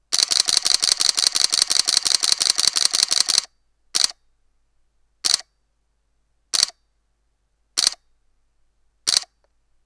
continous H RAW, Canon EOS-40D

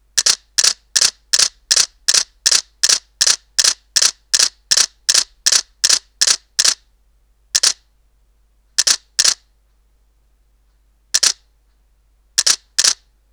D300 continous 14bit; Nikon

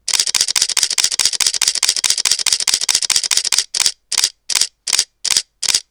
Olympus E-30 high continous; JPEG

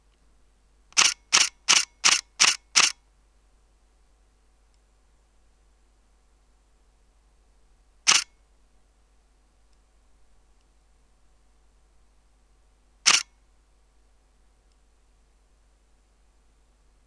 SD14 continous; Sigma

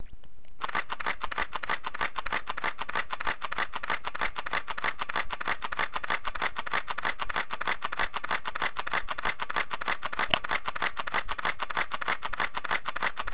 jpeg burst; Samsung GX-10S

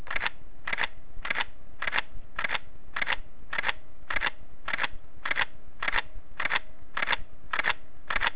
shutter, Pentax K-x

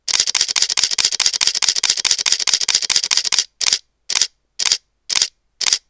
Olympus E-30 high continous

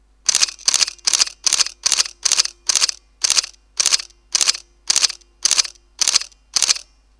continous, Nikon D40

